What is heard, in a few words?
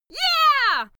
female cheer yeah; schreie; menschen